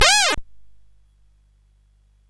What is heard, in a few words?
sid CSG8580R5